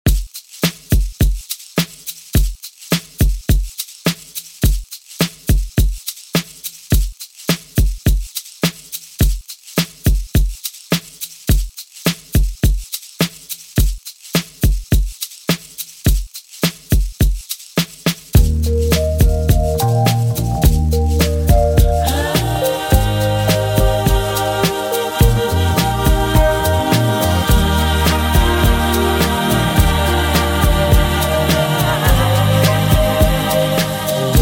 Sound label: Funk Grid Redrum 105Bpm